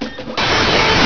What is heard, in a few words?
PRINTER